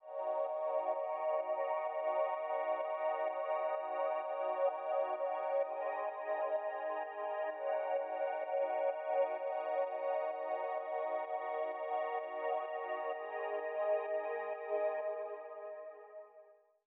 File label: 128BPM Pad Root D#; Vengeance Samples; Basket Kit